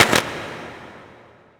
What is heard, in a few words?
firecrackers; ambient; newyear; dota